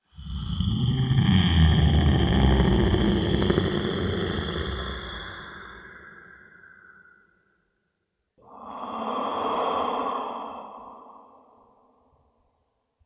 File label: Giant Snoring (Loop)